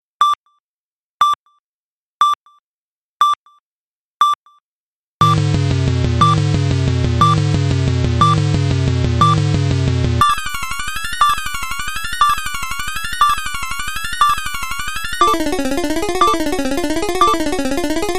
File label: Countdown Timer Jingle